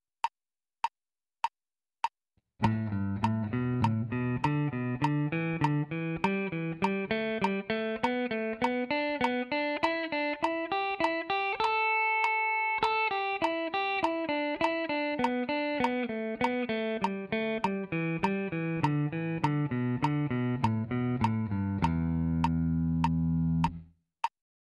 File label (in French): Gamme pentatonique mineure brisée; position 1